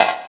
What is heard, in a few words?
PERC, Drum & Bass, 160 BPM